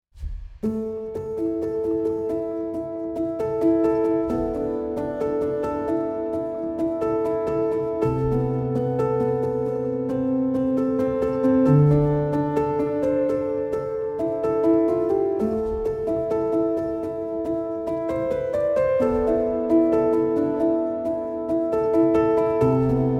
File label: relaxing piano music